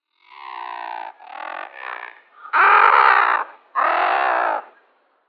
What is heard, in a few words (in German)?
Dickschnabellumme Uria lomvia; Vogelstimmen